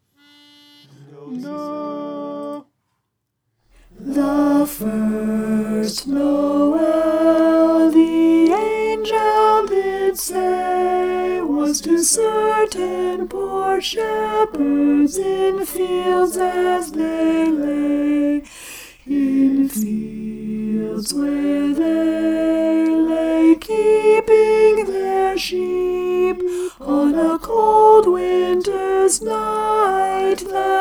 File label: the first nowell alto